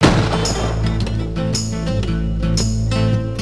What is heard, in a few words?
death; singe